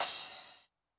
crash cymbal High, 909; classic machines; 909mod; CC - CRASH CYMBAL

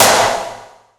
80s Drum Snare; Yamaha PSR E305

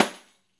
Pandiero, Tambourines

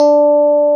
KEY FM, FM Clavi; Club-50 - Foundations Roland; Clavinets

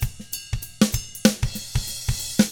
95 funk, drums; ILIO; Double Platinum Drums 1

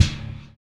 METAL; ILIO - Ethno Techno

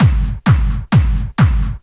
drum 130 techno; Techno Trance Essentials

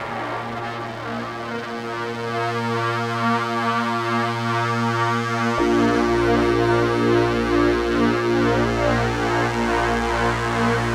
Pad, Melodic Synth; Drum & Bass

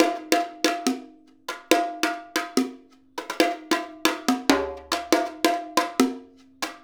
Bongo With Stick; Essential Percussion

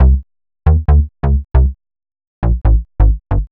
Bass 136 C, uk garage; Stat Bass; 136bpm Lines n Loops

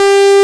MINI WVFORM

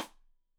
Side Stick Stereo; Deeply Sampled Drums; SMDrum

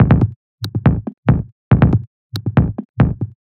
140 drum loops; Knocka; M8; Electronisounds

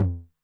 Med Tune Short Decay, 909 LOW TOM; classic machines; LT - LOW TOM

